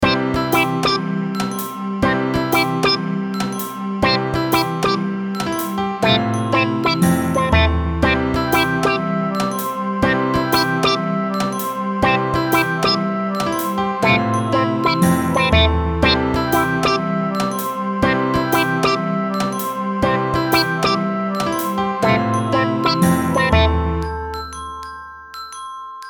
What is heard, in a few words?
Happy 120BPM